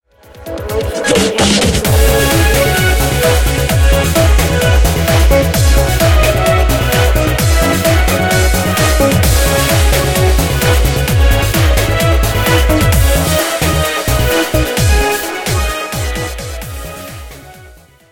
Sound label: BGM xfade; Invaxion